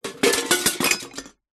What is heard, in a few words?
impacts metal cooking pots hit drop crash